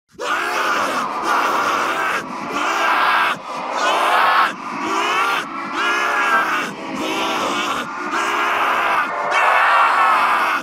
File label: rage; csgo; eternity; scp; 096